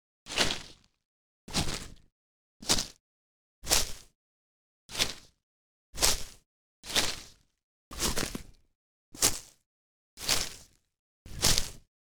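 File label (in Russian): трава шаги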